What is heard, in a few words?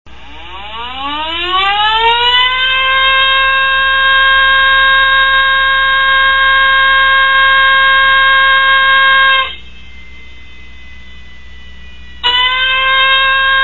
520hz, sound motorsiren; サイレン音; モーターサイレン